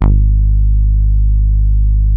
TAURUS A2, synthesizer

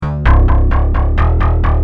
Bass, Industrial

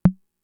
Tom, Fricke MFB-522

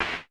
CLAP, Vermona Mono15 Drums

